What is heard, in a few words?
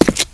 step, player; svencoop